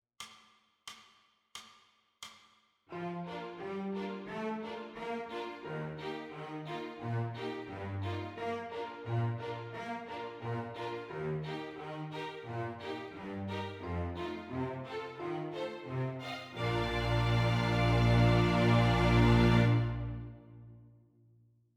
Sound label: Articulation Study